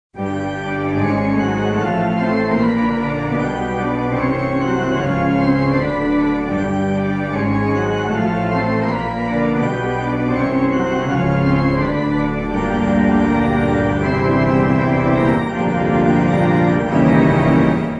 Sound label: organ; Halloween